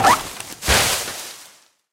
common obstacle palm tree

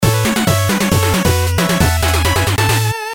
Level Clear; Sigi A Fart for Melusina

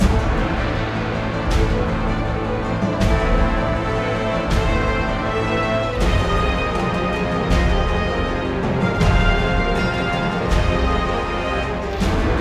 Battle Loop; Hell Warders

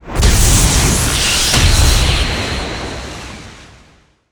Se Gacha

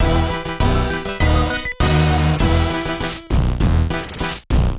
music intro

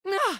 bump; talking tom gold run gamerip 2016; Becca

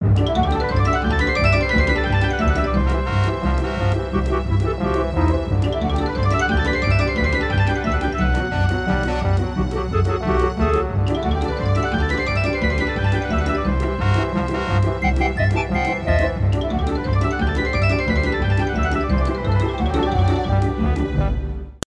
In Game Music; Animaniacs Ten Pin Alley; PS1

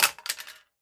Drop Saw; Prison Architect

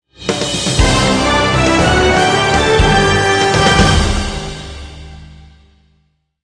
Victory

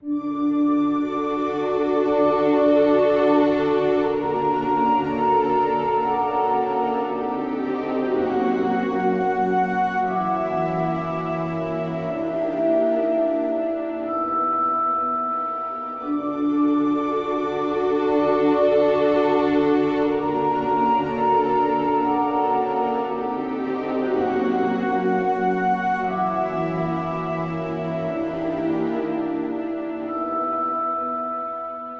fantasy loop music - under water

